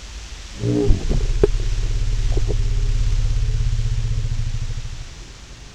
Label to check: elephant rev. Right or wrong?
Right